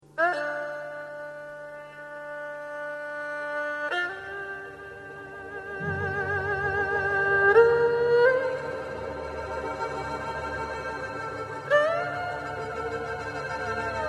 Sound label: erhu